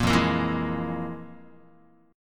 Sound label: F Minor Major 7th Double Flat 5th, Guitar; FmM7bb5 strummed; JGuitar